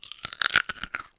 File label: Crunch, cartoon Foley Fx; musicradar; cartoon caper